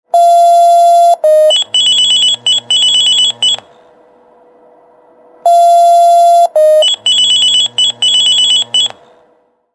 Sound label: motorola minitor v